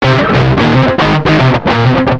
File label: Notifications sound